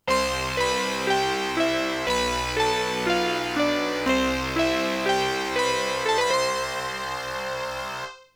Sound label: GAMEOVER